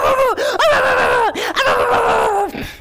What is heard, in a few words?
barking